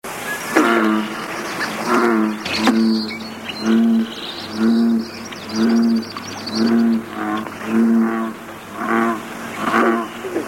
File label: bullfrog; fish wild